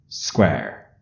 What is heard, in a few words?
Square; WoWInterface; WeakAuras